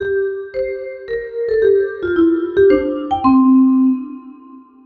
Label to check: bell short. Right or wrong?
Right